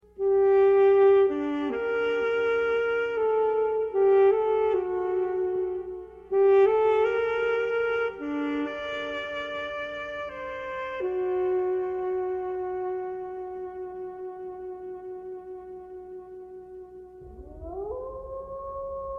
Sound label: pieces for sax